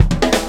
Fill; Mo' Phatt; Digital Sound Factory Mo' Phatt

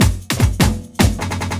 LOOP, 150 BPM

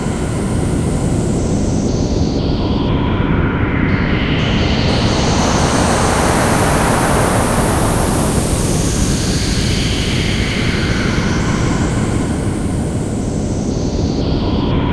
stereo wind; Zero-G Ambient 1; STEREO WINDS

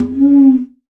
Conga Thumb; Roland BK-5; Percussion

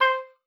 OBOE2, C5; Xsample VOL-6

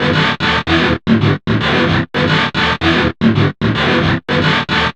GTR LOOPS

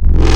Spectral Growl, wavetables; Spectral Growl Wavetables Vol 1

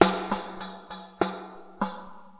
Riq, Acoustic Beats; Sonic Foundry (Sony Creative Software); Myths of Technology

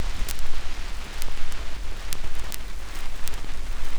SCRCH NOZ, Record Noise; AKAI S-Series CD-ROM Sound Library VOL-4

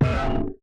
kick, Roland MC-909

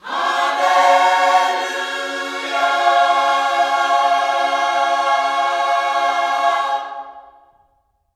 HALLELU, Gospel; HALLELUJAH

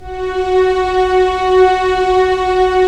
STR, Roland LA Composer

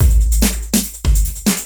CB LOOP; Zero-G; Chemical Beats

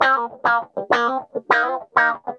GUI, LICKS

